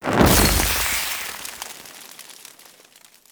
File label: Fire Death Burst; Warframe